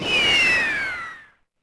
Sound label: Bombs Descend; Civ4 warlords